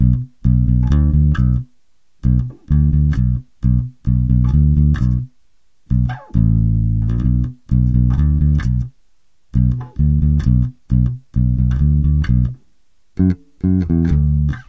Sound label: 065 keep the bass dubby, BASS LOOPS